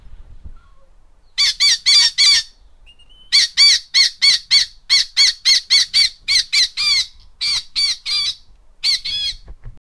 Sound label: the white peacock screech, bird